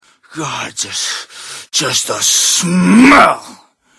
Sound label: cannibal alert; frank